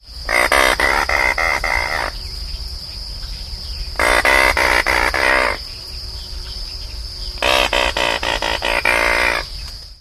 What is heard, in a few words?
Anhinga Bird